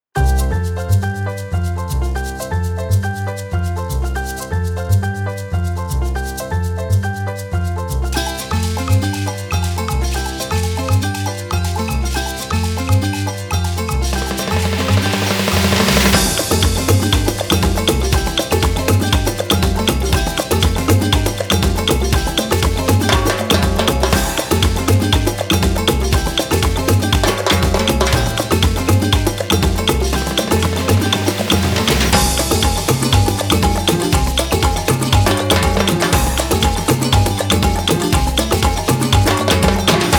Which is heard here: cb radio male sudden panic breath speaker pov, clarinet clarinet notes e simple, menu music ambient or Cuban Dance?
Cuban Dance